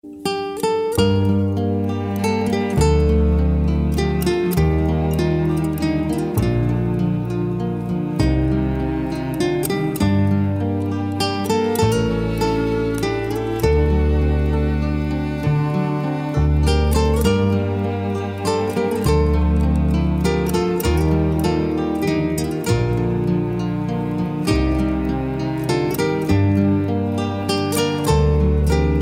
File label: sad guitar